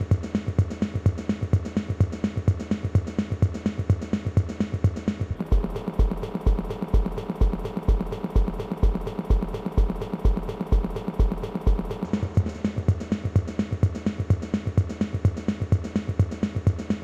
Strymon Magneto Drums Transport Control Low Tape Speed